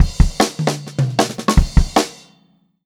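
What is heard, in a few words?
152 Speed Fill, Pop-Rock Drumloops; USB Soundscan vol.37; AKAI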